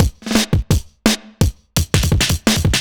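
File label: Drum and Bass Beat 170, drumnbass 170bpm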